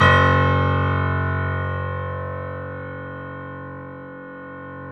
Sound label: Small Pianos, 3-D Audio Collection